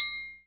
TRIANGLE, PERCUSSION; GM PERCUSSION KIT; AKAI S6000 CD-ROM - Volume 5; GM PERCUSSION KIT 2

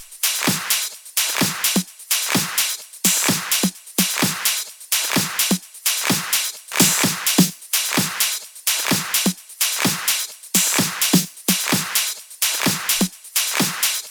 Loops 128BPM, Drumloops

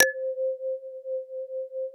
MALLET PADS; Pro-Rec - Synthsonic

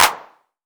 CLAP 909, DRUMMACHINES

